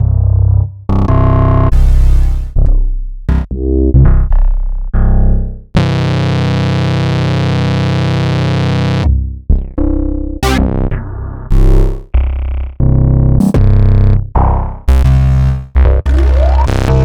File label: Bass Hits